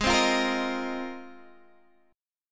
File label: G sharp 6th Add 9th; G#6add9; strummed; x,11,10,10,11,11